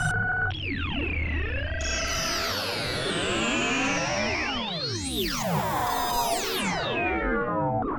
scoring pack fireworks matrix

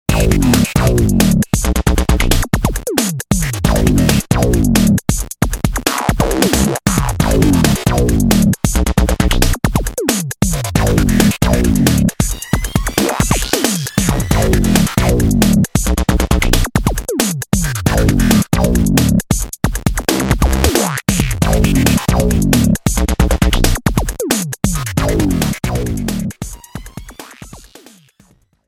Electro

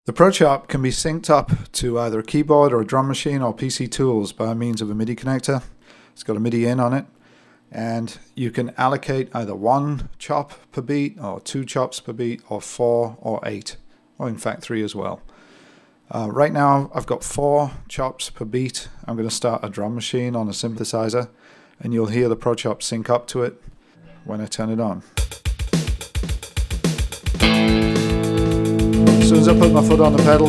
2 Chops per beat

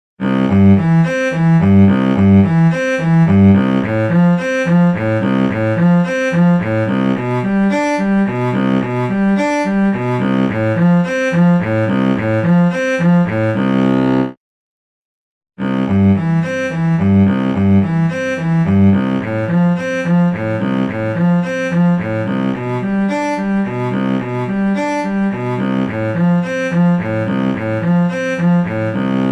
Cello

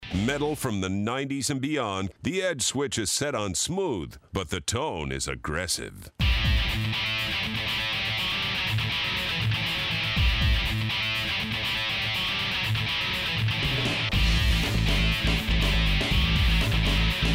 Metal 90s

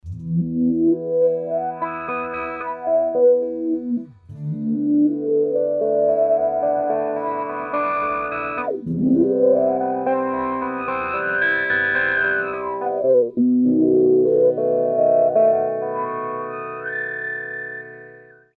guit

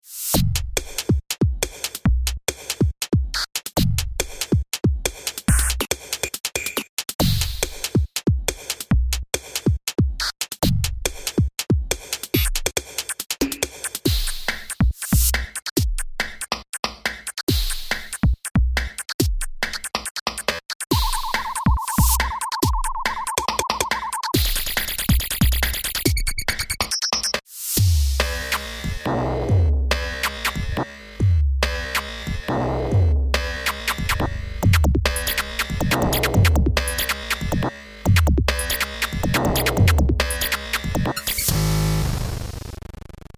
kit

